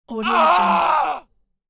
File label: scream from man in pain; sound ideas